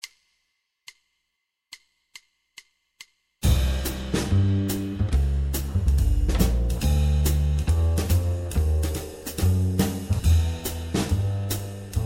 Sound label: jazz guitar chords backing track